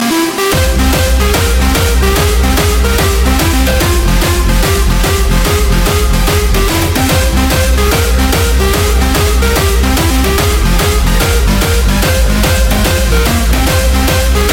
Rage Italian Ringtone

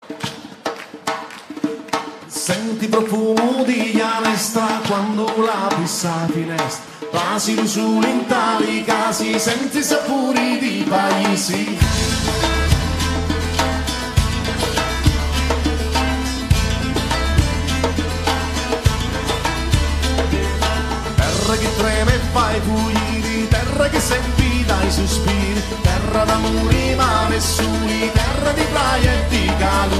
Tarantella Italian Ringtone

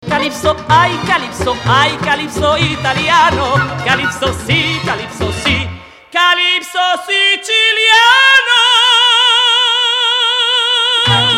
Calypso Italiano Italian Ringtone

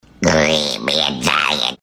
Creamy Italian Italian Ringtone

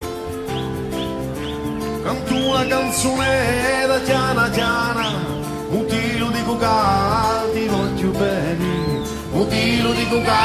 Tarantella Nova Italian Ringtone

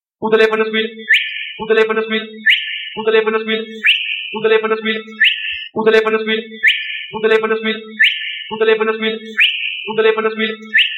Telephon Squil Italian Ringtone

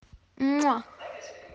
Muah; Italian Ringtone

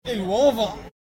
Mutetta Eggs Italian Ringtone

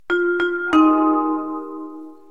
Italian Jingle Italian Ringtone

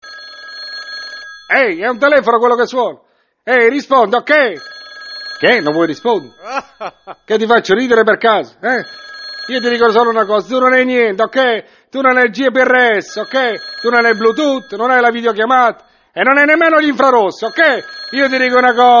Cornetta Distintivo Italian Ringtone

